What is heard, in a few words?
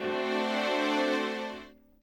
chord